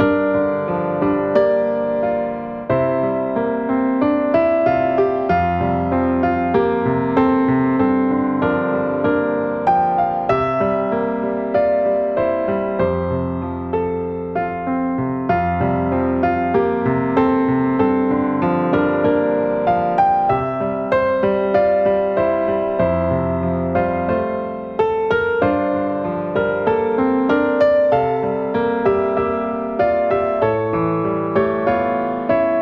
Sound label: accomp